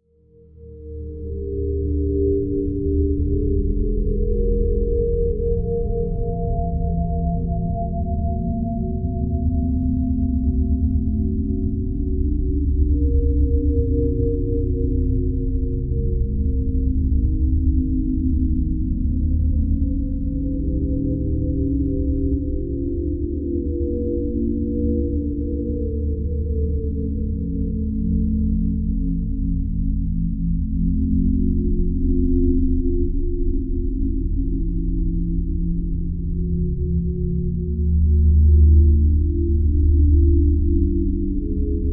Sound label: Music Eerie Low Amb, pads